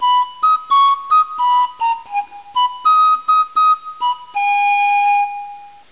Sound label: Panpipes